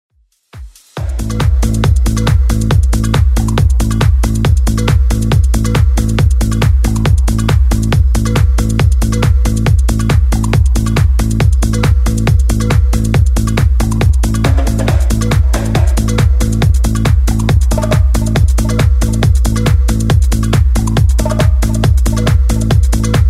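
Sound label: 90s, 138 bpm; Redrum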